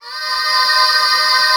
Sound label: male oohs, vox; E-MU Formula 4000 Series Vol. 1 - Hip Hop Nation; Vox FX